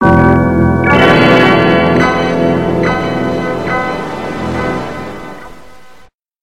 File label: windows 95 startup in g major